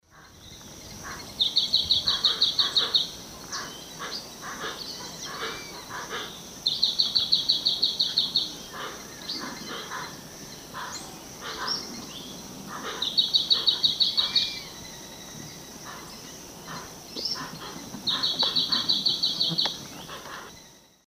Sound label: Polioptila plumbea, song; S. America; Eastern Lowlands